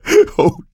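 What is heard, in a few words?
laughter, male; survivor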